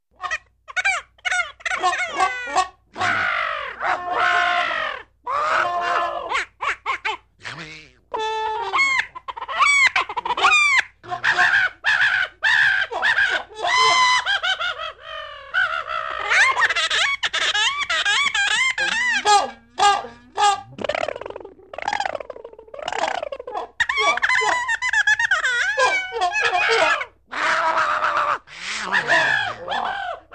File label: Screwy Animal Vox, animal voice